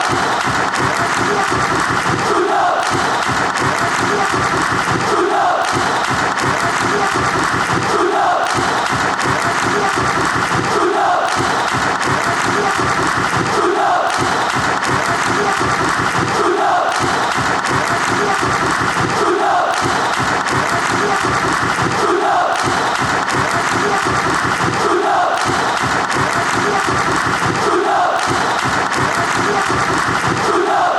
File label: fan chants; stuttgart vfb